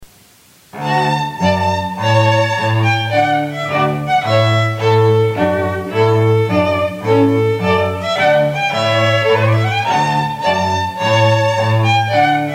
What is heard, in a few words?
Trumpet Tune String Trio